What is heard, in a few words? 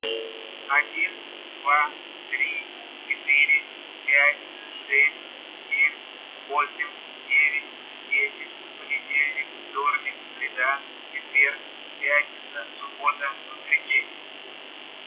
buzz 50hz, TONAL NOISE